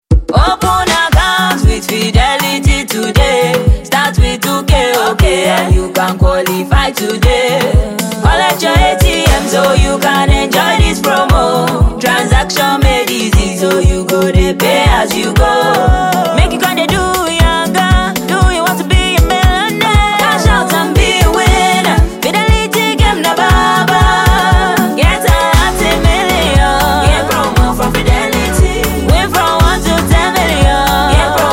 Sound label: Jingle